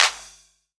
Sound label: clap, hip; Best Service; Dance Mega Drums